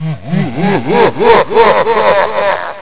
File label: laugh